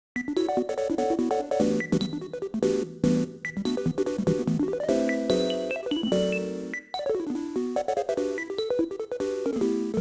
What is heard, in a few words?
meowsynth